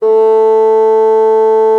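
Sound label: bassoon forte A3; Xsample VOL-6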